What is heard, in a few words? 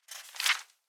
open flip, book; item; Minecraft; Conquest Default